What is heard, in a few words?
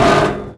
pry door